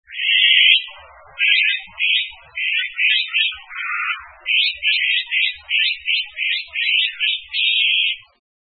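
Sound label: long-tailed tit; onaga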